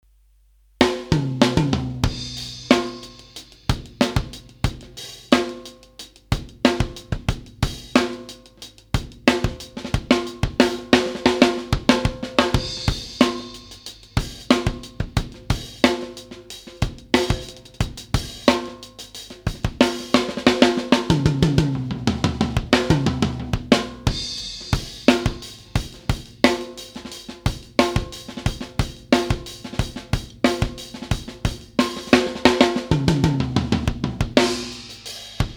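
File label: Set, drums; Kirchhoff Test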